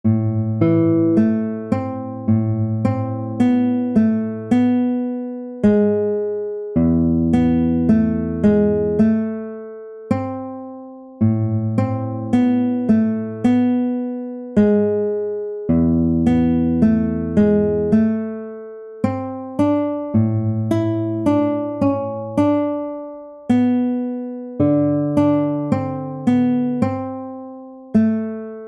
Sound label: duet guitar II; prelude 4